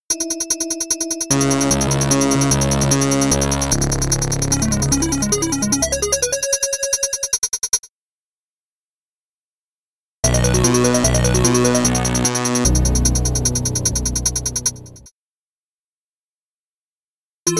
In-Game Music; BloodNet; Amiga

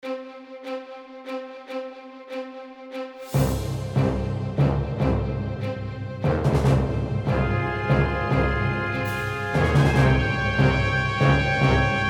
battle music

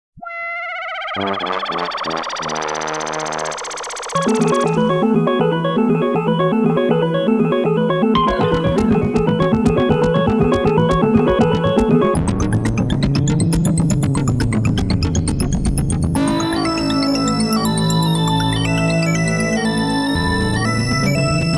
Synth Version; Saiunkoku Monogatari OST 1; Tsuchita Usuba Ashi

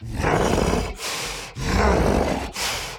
Walk Cycle Burns; The Town

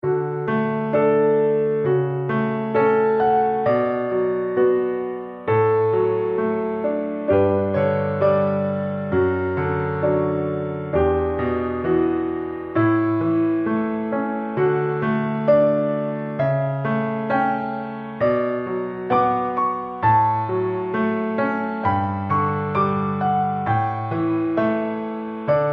bgm novel lowtempo; Goodroid Inc; Android

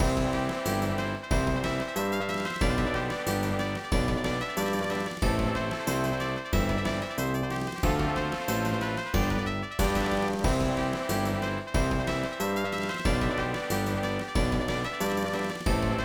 Jig; eigo de tabisuru little charo

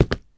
Concrete; Five Nights at Freddy's Final Hours 2

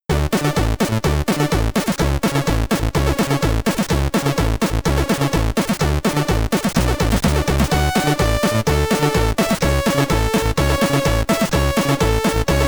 Intro (SID Stereo), commodore 64; Eskimo Games

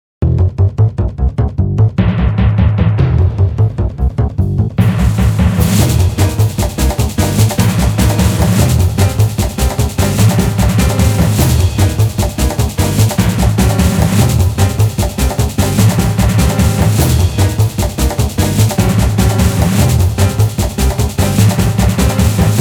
Typical Battle Bgm; Seven Venus soundtrack

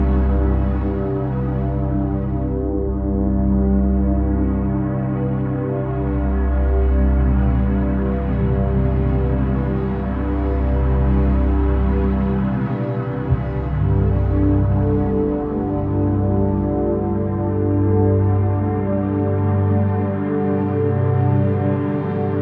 Dreamy Drone; The Dwarves 2016 ps4 gamerip

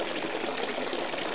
Tank refill; In the Line of Duty Firefighter

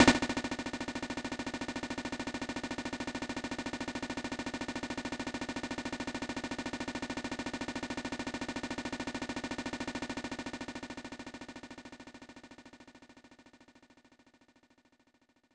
Drumroll; Snoopy Concert; SNES

